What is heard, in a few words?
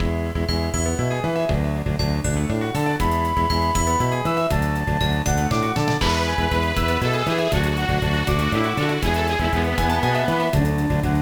BGM; Zero Tours; gba